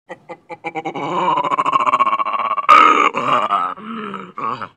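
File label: chain of short grunts; jivotnyie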